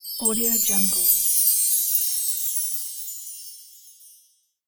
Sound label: magic; blackdragonfx